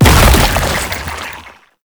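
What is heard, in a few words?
Rolling Rot Projectile Explosion; Enemies; Abilities; Warframe; Proto Infested Babau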